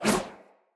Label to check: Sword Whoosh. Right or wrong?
Right